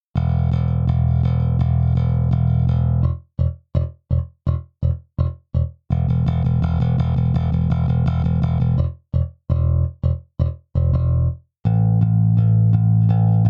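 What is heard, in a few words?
Studio Bass, default setting, pick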